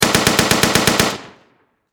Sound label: Warfare Machine Gun Stg44 German Long Burst Close Perspective Mp44; SFX Bible